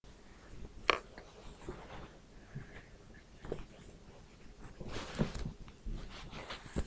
beat

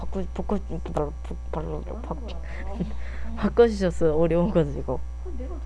bucket

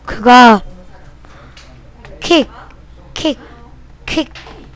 kick

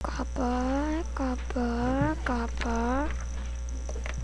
gobble

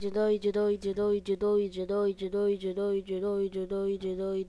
noise

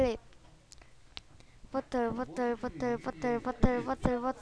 bottle

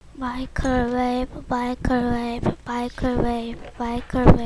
microwave

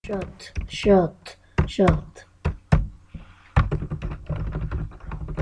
shut

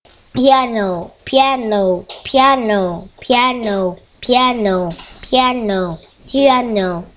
piano

